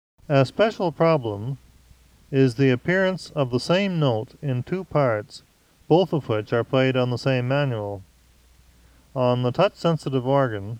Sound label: Repeated Notes; Le Caine Hugh; Compositions Demonstrations